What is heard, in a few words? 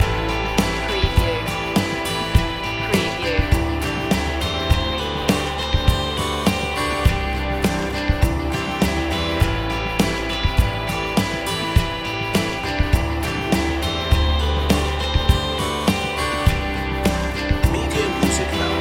ocean breeze loop